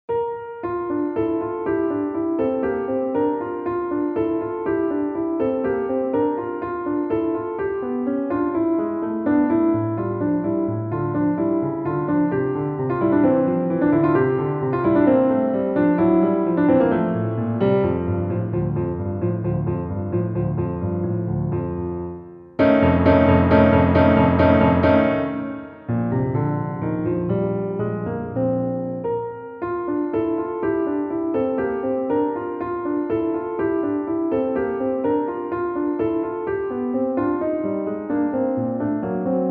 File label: concert; satie